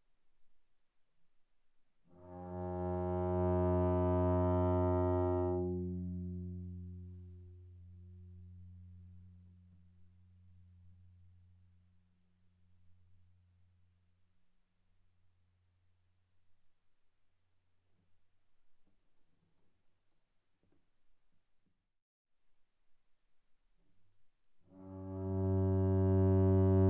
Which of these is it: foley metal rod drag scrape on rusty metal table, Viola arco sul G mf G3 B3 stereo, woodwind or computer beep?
Viola arco sul G mf G3 B3 stereo